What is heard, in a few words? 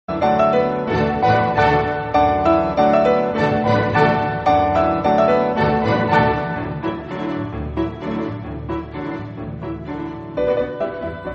piano